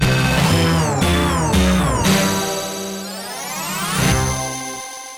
Way Longer Suspense Music; Riverboat Gambler; Williams; pinball

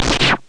powerful energy gun

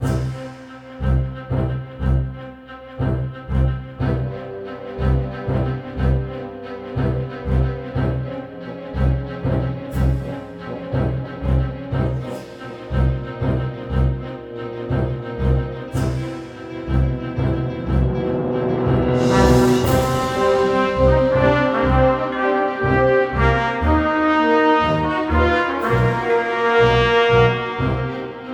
objective stinger; Impossible Creatures

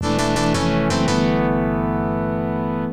Unused Fanfare; Gambler Jiko Chuushinha Katayama Masayuki no Mahjong Doujou; Genesis

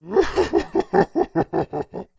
King Pig Laugh

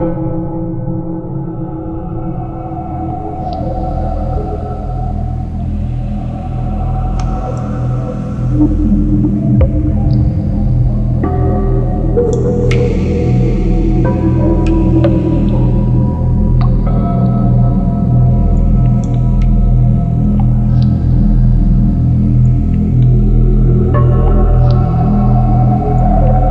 Dark Ambience; Steamworld Dig 2

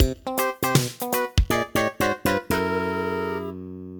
Construction Fail; City Bloxx

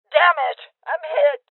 Voices Injury Female Old; rebel cops 2019 gamerip

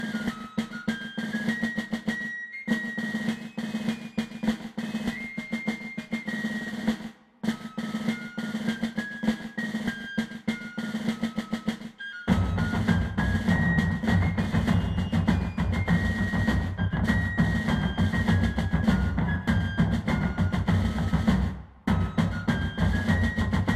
Fanfare Ambience; Assassin's Creed III

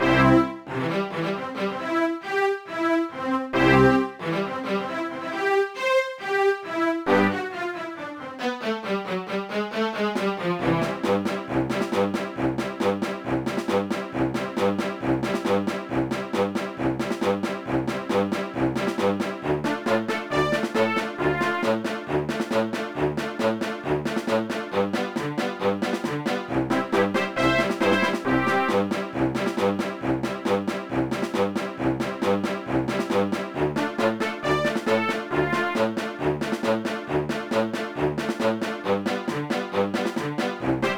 Classical Stage (Karaoke); pinky street kira kira music night pop town gamerip

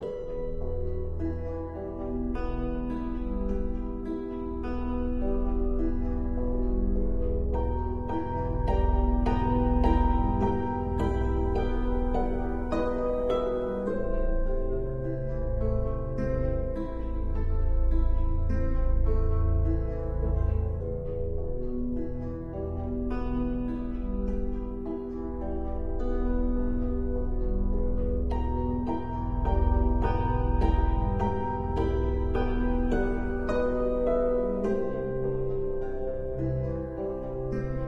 Eerie Loop

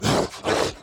Enraged Breathing Loop; The Town; Burns

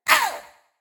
pain

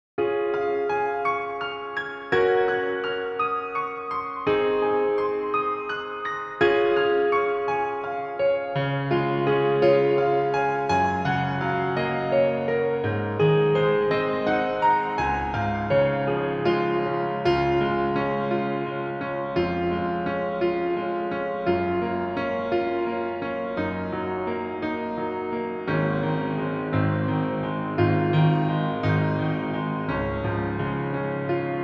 Piano Playing; Izayoi no Fortuna Original Soundtrack CD

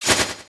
sword

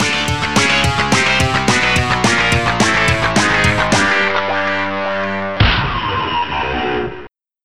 Jingle Slow; No Second Prize; Amiga

